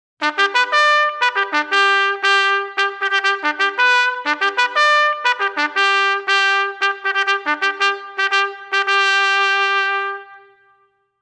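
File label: Sick Call, bugle call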